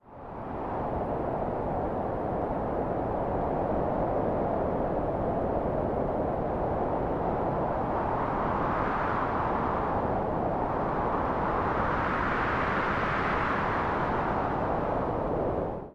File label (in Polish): wiatr